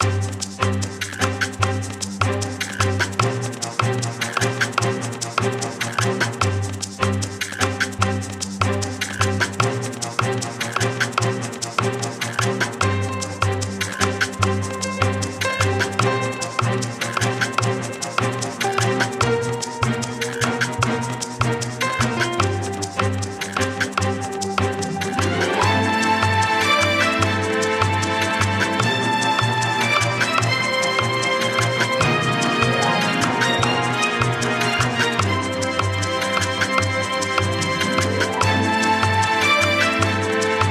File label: story serious; summer